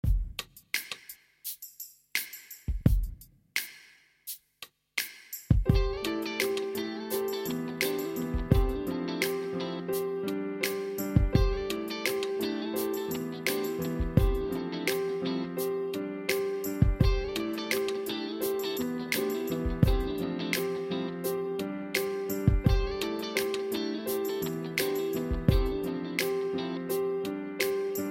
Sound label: music dance chilling guitars